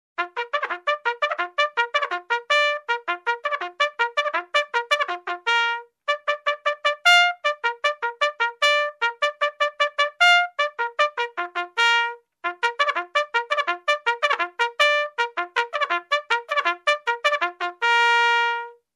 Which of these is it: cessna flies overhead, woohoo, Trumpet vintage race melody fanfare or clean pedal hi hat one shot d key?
Trumpet vintage race melody fanfare